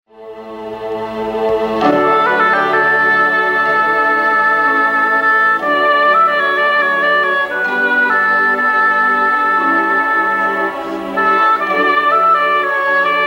oboe oboe

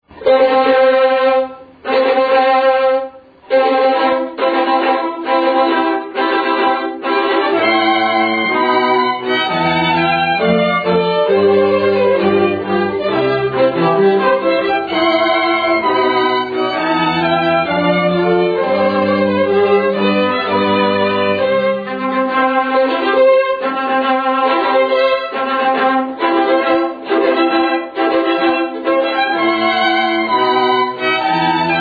mendelssohn marcha cuerdas; Marcha Nupcial –Mendelssohn2

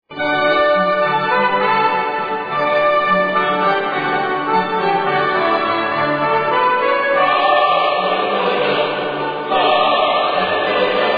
alleluia, octeto; Alleluia - Haendel; SALIDA

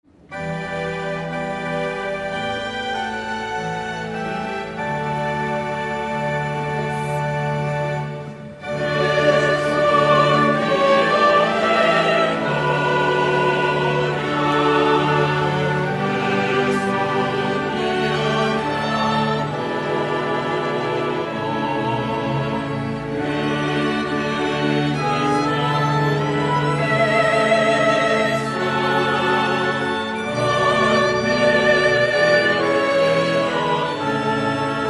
pompa y circunst octeto; Pompa y Circunstancia - Elgar3; La Agrupación Laudate Dominum

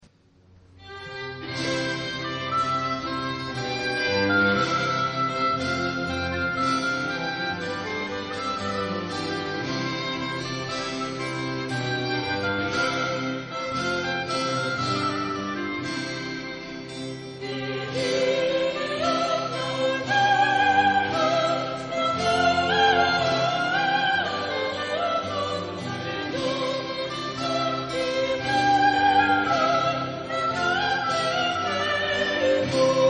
te deum; Te Deum – Charpentier2

